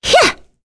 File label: Vox Attack; Lewsia; kingsraid; voices heroes en